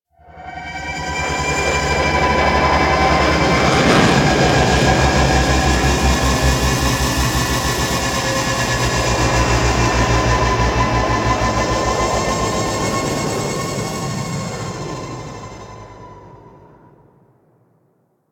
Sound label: Liset Extraction Space, Vehicles; Nora; Flight